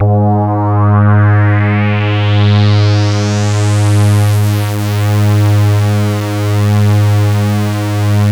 RESO G#1; Best Service Alpha Dance vol.2

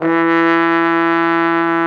Trombone; Sound & Vision - Gigapack II CD 1

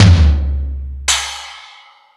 Metal Drums, E-MU Pro-Cussion; drum machine

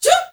FEM GRP, FEM NOISE

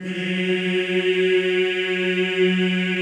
CHO F#2 Eeh; Eeh + Ehh

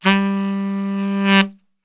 G 1 SAX, Brass Super Section; Best Service

